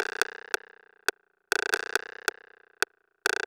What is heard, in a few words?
RIM, Techno 138 BPM; Best Service ProSamples vol.54; AKAI